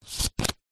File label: leisure playing card turn over on top of deck